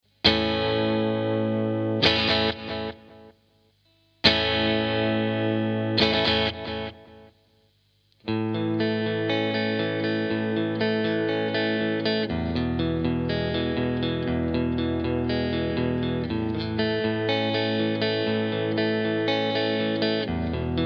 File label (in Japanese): dd 3 mod スイッチ左